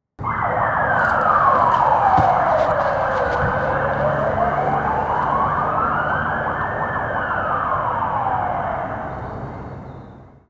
ambience a crook disturbed mid crime by loud police siren